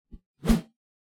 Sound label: melee attack swoosh heavy, weapon swooshes; psfx